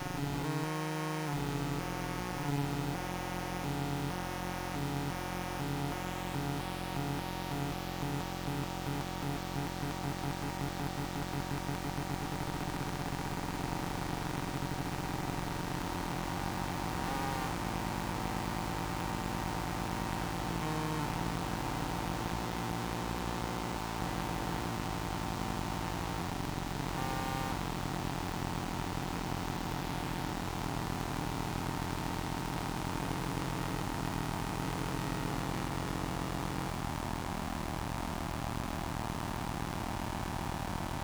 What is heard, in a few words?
motorcity drone, weird sound generator samples